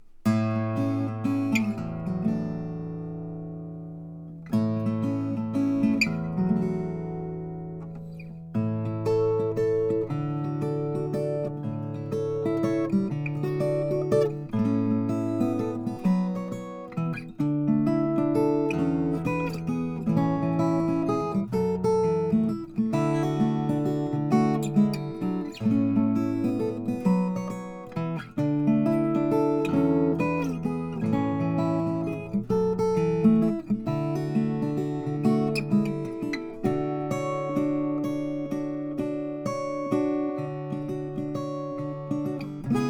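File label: l deluxe mahogany, guitars; froggy bottom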